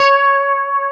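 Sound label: C#5B, compressed Strat, guitars; Spectrasonics; Hans Zimmer Guitars VOL-1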